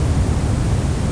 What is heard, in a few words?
jetpack; cstrike